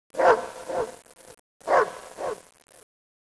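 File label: ambient, halloween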